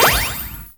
SE GAME EXTAP; maimai

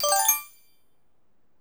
MAP GET DX; maimai

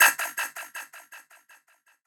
bass3

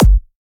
Dr Kick

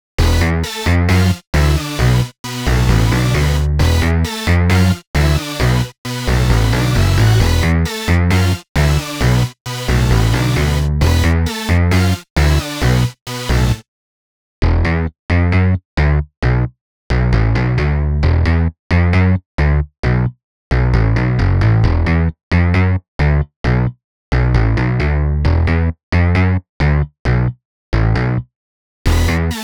inst

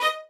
violin

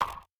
breakbeat

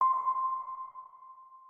organ

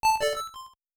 Mail; 主播女孩重度依赖

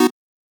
Chord

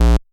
bass

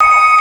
Ding D#5; 音MAD; 学园孤岛

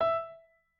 piano

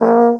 Euphonium A#4; 音MAD; 吹响吧！上低音号

